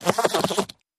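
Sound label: Motor Small Vibrate, variable speed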